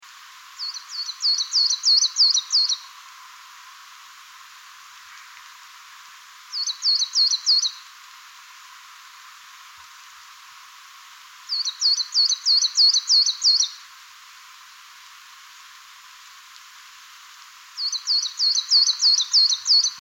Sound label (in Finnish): kuusitiainen; laurinmaki